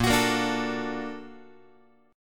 A sharp Augmented Major 7th A sharp down Guitar, A#+M7 chord; strummed; JGuitar